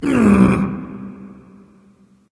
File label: nv tongue; Smite; awilix